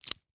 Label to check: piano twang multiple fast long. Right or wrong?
Wrong